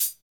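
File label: hi hat; Best Service Gigapack 2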